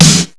PAD

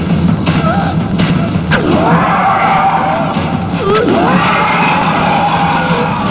dragon

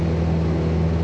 PROP; FALCON4

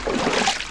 step; KINGPIN; player; water

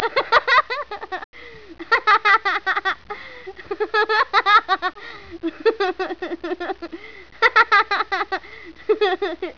Laughter child, mono; ClipMedia

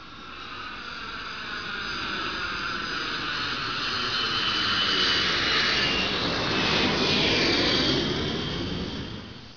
jet; 12000 Graphics Pack - A Complete Graphics Collection For Your PC

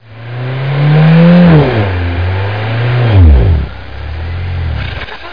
BMW; Exit Off

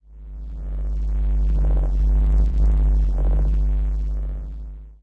GURGLE; Oasis demo

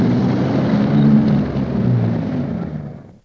track e; PC Gamer IT CD 32

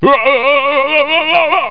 WOWOWOA; The Ultimate Guide to Mortal Kombat

